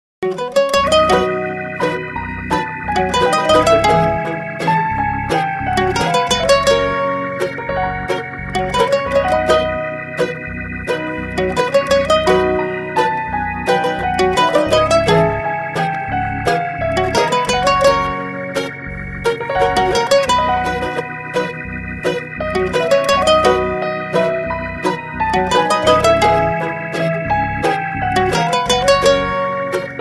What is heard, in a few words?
instrumental